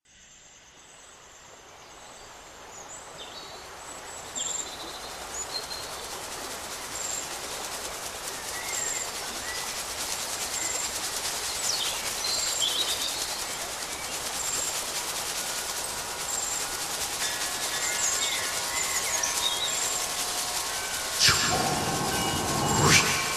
oiseau mystere musique chamanique tambour

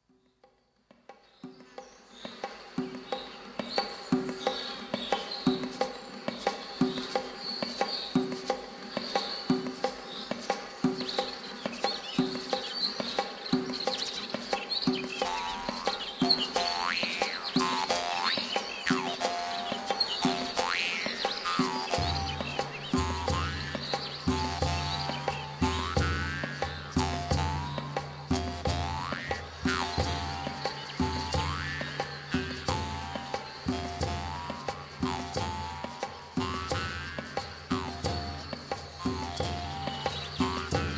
celebration musique chamanique tambour

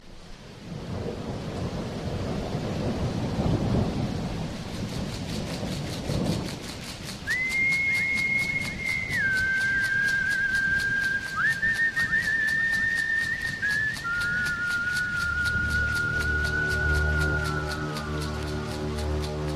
natural musique tambour chamanique

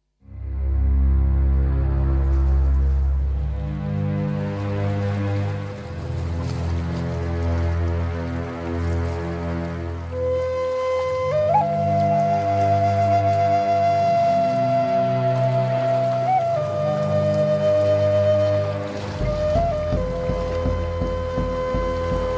natural musique tambour chamanique

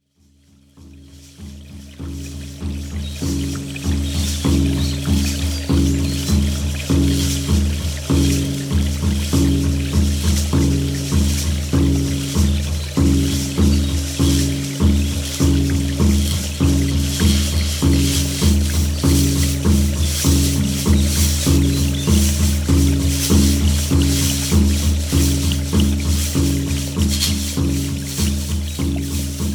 wesak musique chamanique chamanisme

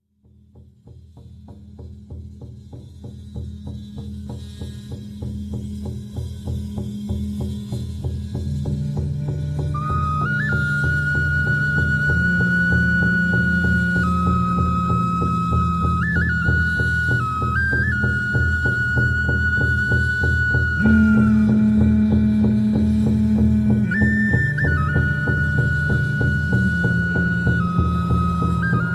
musique chamanique tambour

